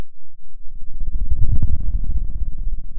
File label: Sound of a dinosaur